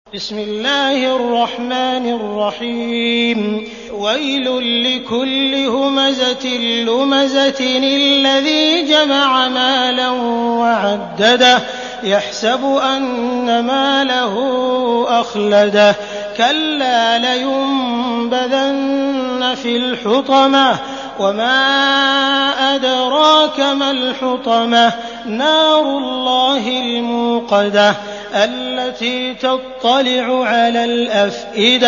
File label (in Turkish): Humeze, kuran; sudeysi sureler